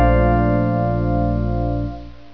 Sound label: DING; Professor Multimedia